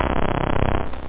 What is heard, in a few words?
buzz; da capo vol1; instruments; rave